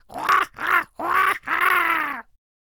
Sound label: Scarecrow Attack Vocals